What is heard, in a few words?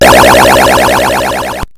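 game start; robotron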